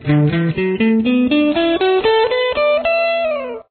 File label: e scale pattern example, guitar